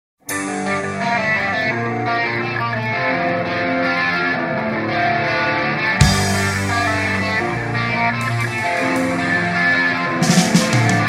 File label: rock sound